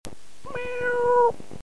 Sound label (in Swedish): katten